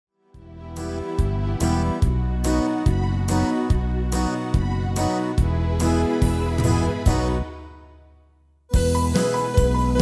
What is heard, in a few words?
MIDI